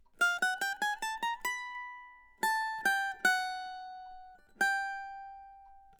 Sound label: Cavaco